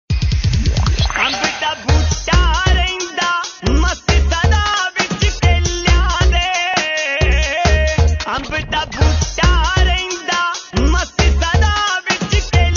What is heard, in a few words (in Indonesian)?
suling